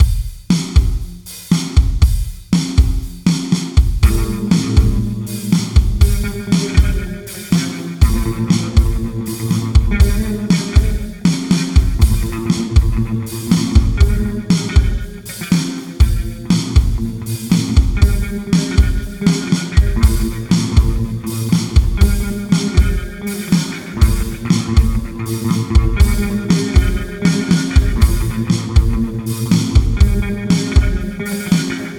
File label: Indie Rock